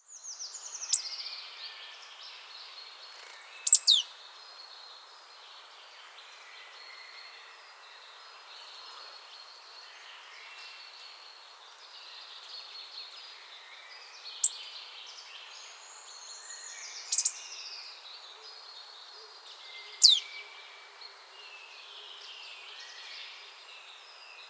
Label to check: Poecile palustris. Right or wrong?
Right